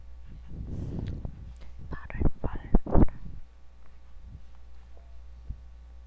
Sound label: bottle